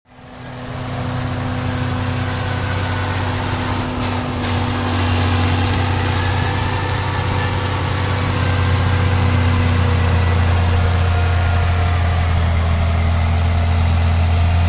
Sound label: Bulldozer; Bulldozer At Work. Close To Mic At First, Then Moves Away To Mid Shot, Then Returns To Mic And Continues To Work.